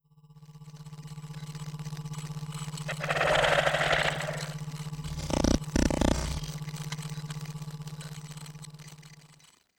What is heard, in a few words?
ai crisis room hits; Stellaris; synthetic dawn